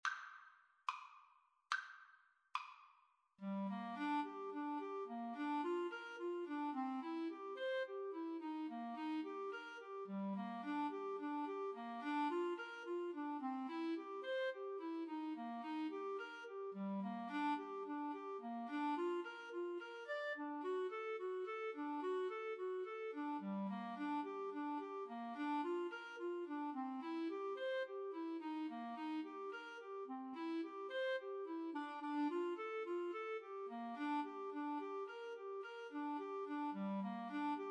Clarinet Duet

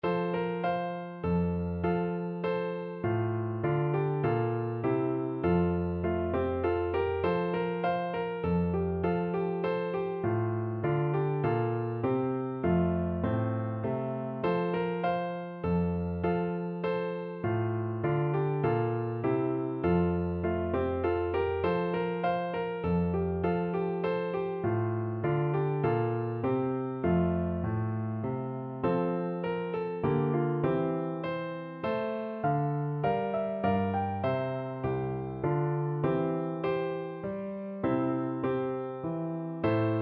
minuet g PNO, piano; Telemann

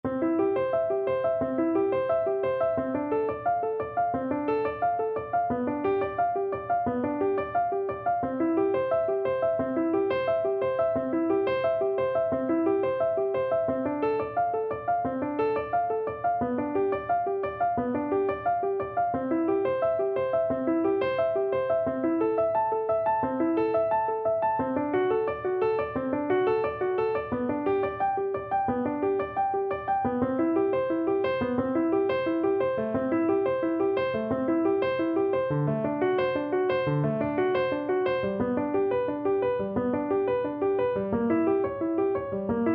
Oboe; gounod ave maria

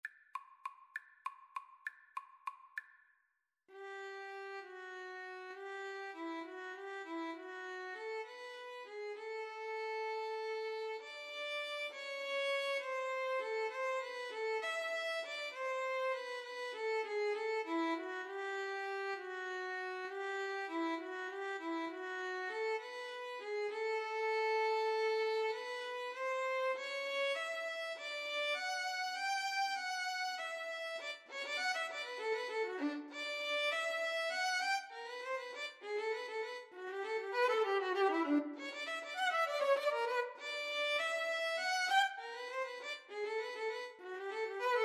Violin Bass Duet; tchaikovsky waltz

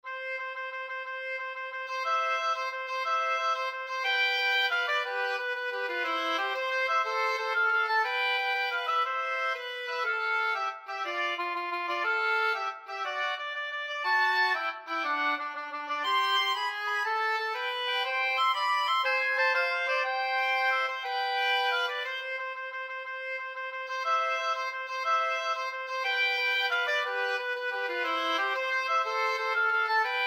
italian, oboe duet

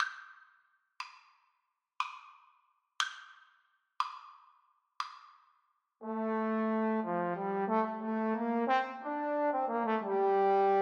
adagio duet, Trombone Duet

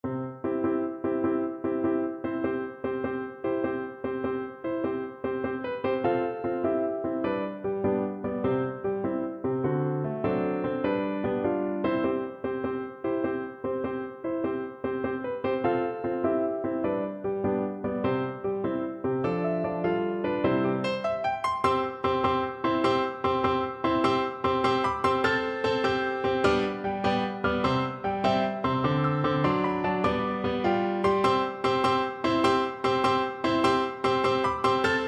Overture Oboe

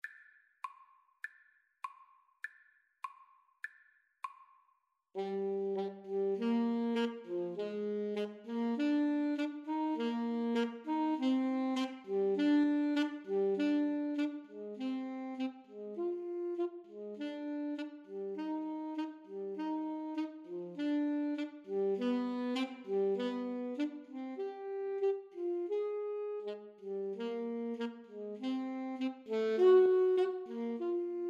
Alto Saxophone Duet

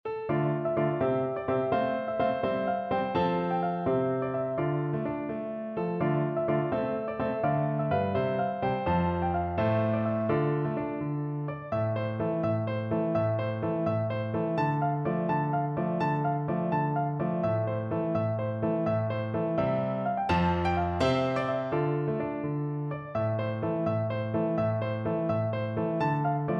playford black nag piano

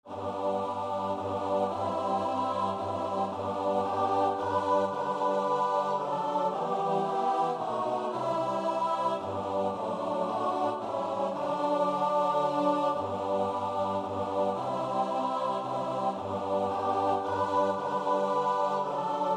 choir

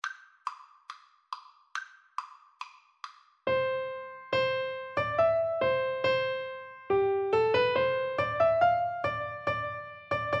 tom tom pipers son, Piano Duet

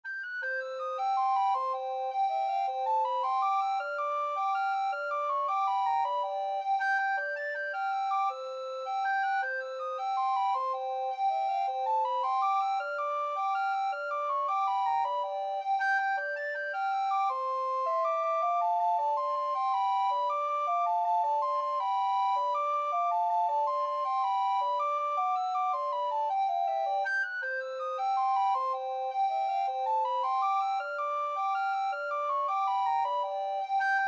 recorder

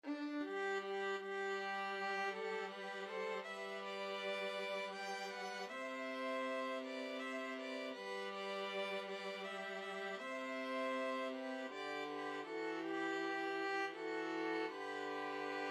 Shenandoah, 2 violins viola

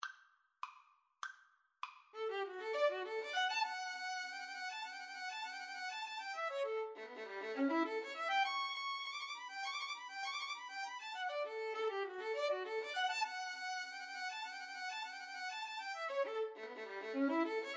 clarinet polka, Violin Duet

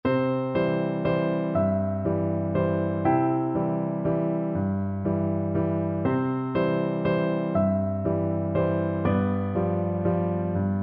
looby loo piano

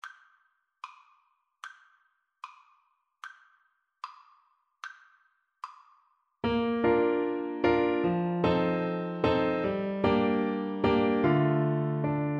clarinet

